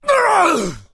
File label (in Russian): zvuk boli